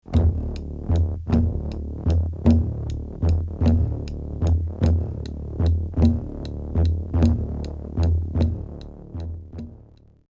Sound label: DIJERIDO